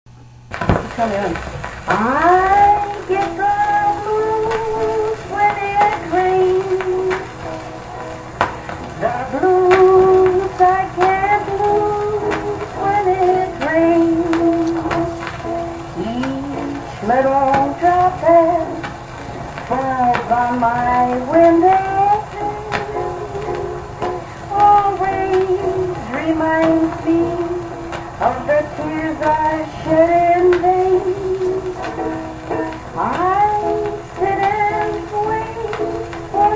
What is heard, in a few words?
Uke Blues When it Rains